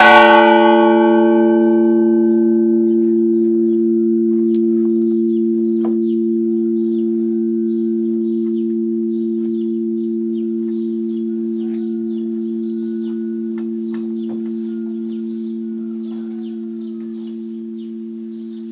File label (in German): Grosse Glocke ganz ein Schlag; Grosse Glocke Ganz ein schlag Mono